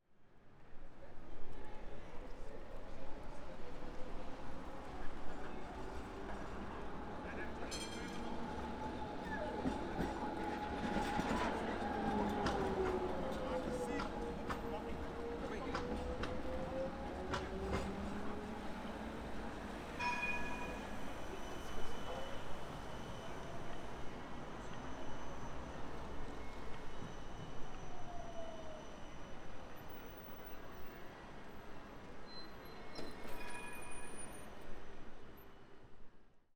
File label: Amsterdam Tram Passing Tourist Talking